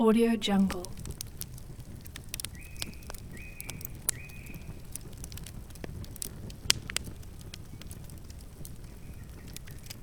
campfire; impulssound

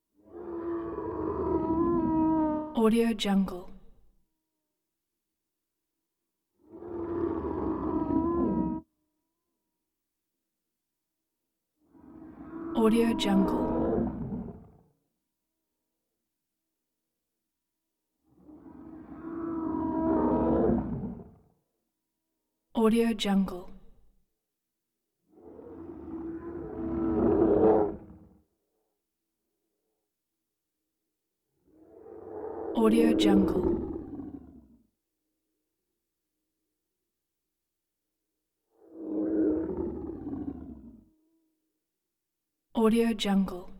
snake creature cries big moan or scream cry; prosoundfx

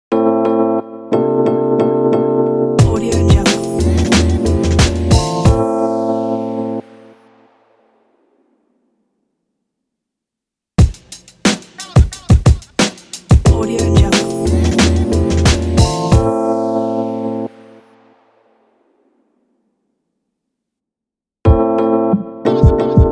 chill hip hop logo

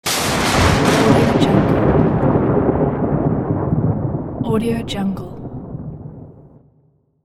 thunder

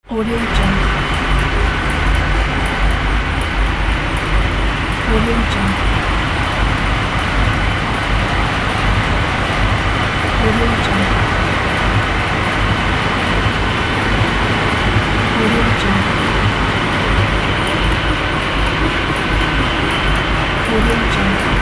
diesel engine room

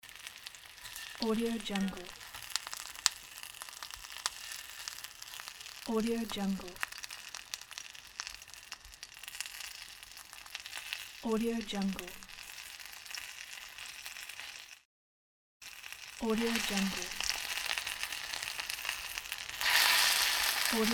frying

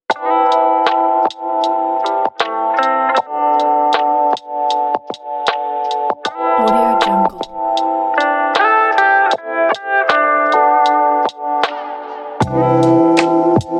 this is lofi

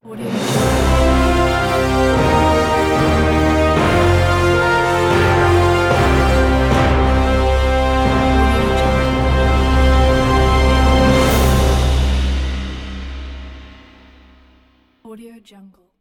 epic cinematic orchestral intro ident

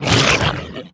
excavator beartrap release; Monster Within; soundmorph

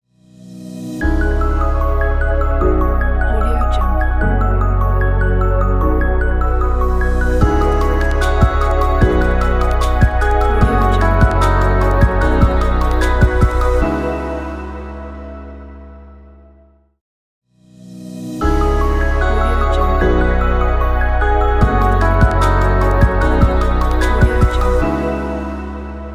calm inspiring technology logo

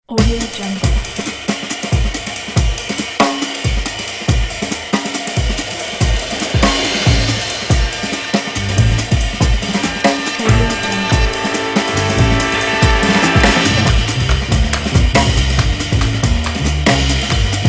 the fast jazz intro

